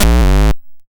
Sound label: YM Kick, DRUMS